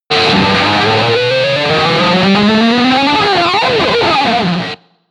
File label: distortion